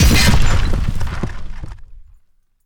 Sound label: crash